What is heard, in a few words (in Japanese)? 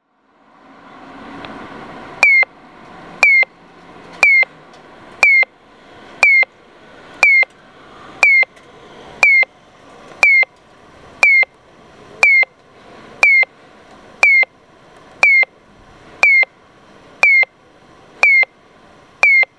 kyosan LTA BMS 2 piyo, kosaten okayama; higashi saidaijikami1chome